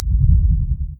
Attack Move Left

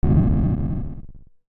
slow outro

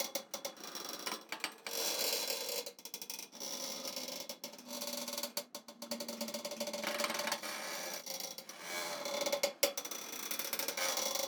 tools wire stretch loop; teardown; ps5 windows xbox series xs

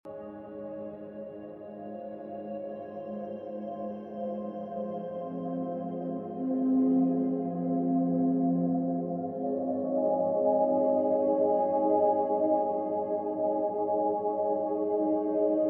Music ambient; Candy Hoarder; Wii U

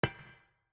Desert SFX, Note Failure G3

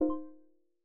hud pause on

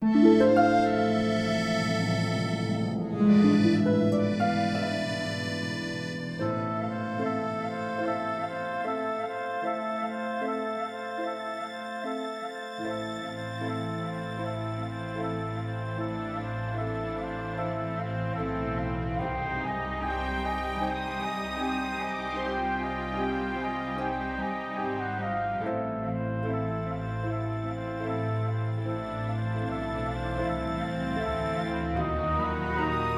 cutscene music

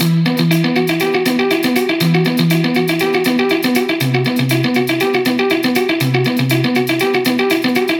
Music Danger

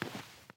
snow step; teardown ps5 windows xbox series xs gamerip 2022

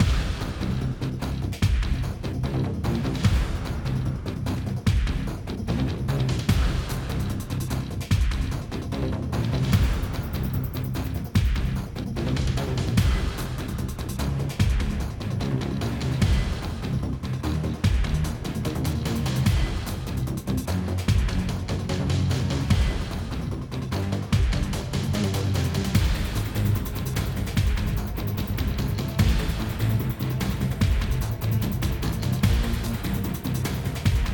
Combat Boss; Grom01

